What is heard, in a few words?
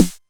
Snare, Novation Drumstation 909; Novation DrumstationV1